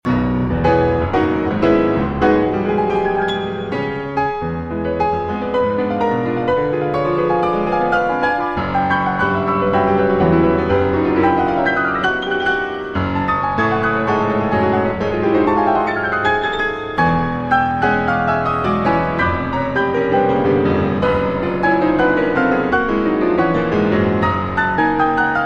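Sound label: Variations on a Polish Folk Theme, Piano Works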